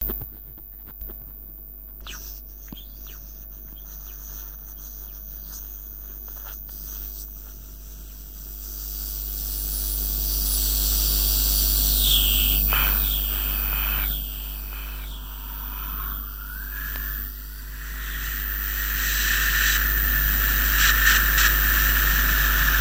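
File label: zombie sound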